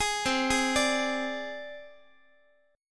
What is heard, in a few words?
C Suspended 2nd Sharp 5th, down, Guitar; Csus2#5 arpeggiated; JGuitar